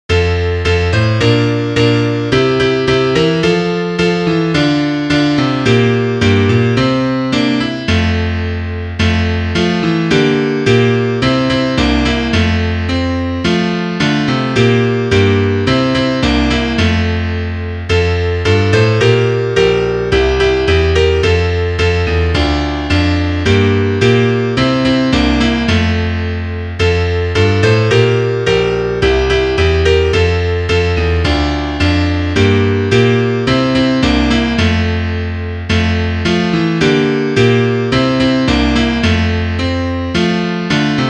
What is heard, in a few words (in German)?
Klavier; guen sind alle meine kleider